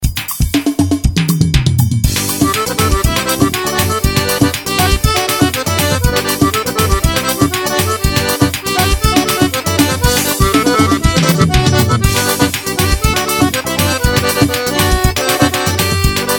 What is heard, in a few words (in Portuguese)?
forro solado, acordeon